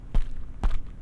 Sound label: walk path; spiritheroes